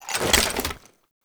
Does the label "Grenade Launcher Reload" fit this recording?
Yes